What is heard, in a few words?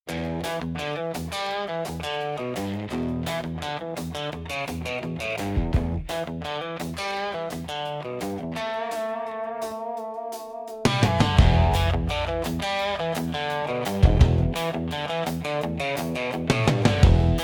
desert country engine